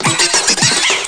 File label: Nasty Car; Sfx stack 5